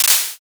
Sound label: clap